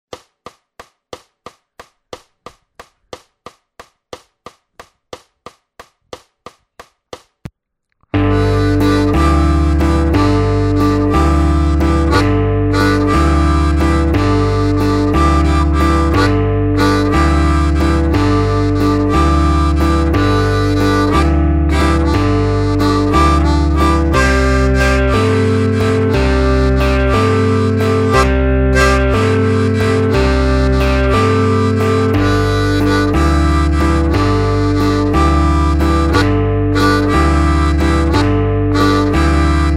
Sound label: Rockin Rhythm SLOW